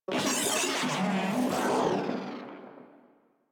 Vocal Death, Enemies; Warframe; Moa

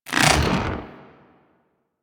Head Pull; Warframe; Teralyst; Ropalolyst; Flight

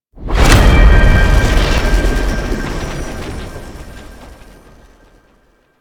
Undercroft Static Blast; Duviri; Ui

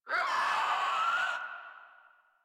Infested Crawler Attack Short; Warframe

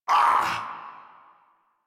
pain; Warframe; Tyl Regor Boss; Efforts

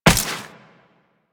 Step Ice, Footsteps, Walk; Warframe; Tenno

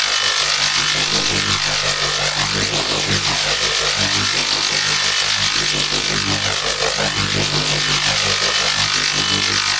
Gas City Lightning Rod Ambient Loop; Ambience; Props; Warframe; Corpus Gas City; Props Remaster

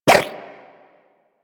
Inf Throwing Star Whoosh, Infested; Warframe; Weapons

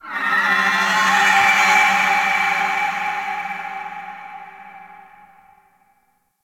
Ocean Underwater Creatures Small; Ambience; Warframe; Grineer Ocean

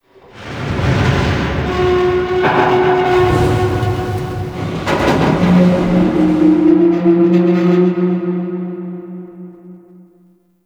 Metal Groans; Ambience

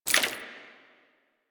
Generic Footstep; Warframe; Warframes; Dagath

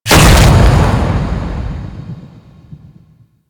Lymax Grenade Explosion

